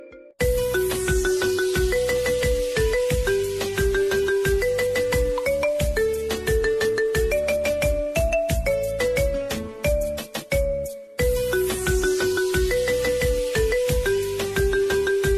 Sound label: Remix Ringtone